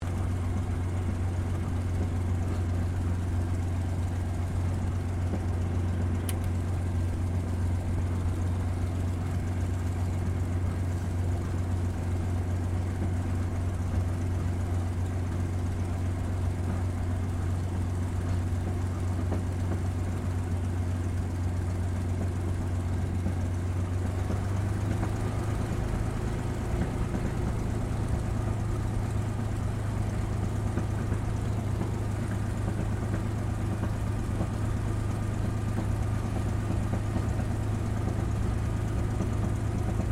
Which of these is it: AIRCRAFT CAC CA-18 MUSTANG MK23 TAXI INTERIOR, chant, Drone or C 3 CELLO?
AIRCRAFT CAC CA-18 MUSTANG MK23 TAXI INTERIOR